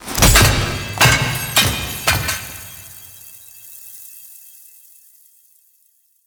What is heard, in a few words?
Mask Throw; Warframe; The New War; Throne Room